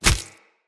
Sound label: Unarmed Punch Impact Default; Weapon Impacts; Player; Combat